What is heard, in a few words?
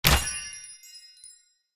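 attack arrow shining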